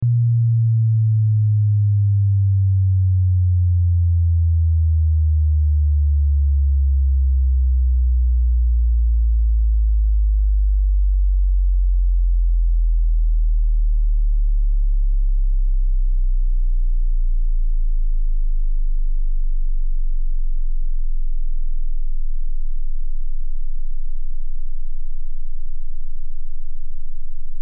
LFE Test Tone Osc, Lfe Test Tone Oscillator Log Sweep 10Hz-120Hz; Lfe Test Tone Oscillator Log Sweep 120Hz-10Hz 30 Sec -12Db Log Sweep; Blastwave